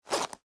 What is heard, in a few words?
Leather Jacket, Zipper, Up; Foley; Clothes; Pocket; Dressing Sound Effects; BlastWave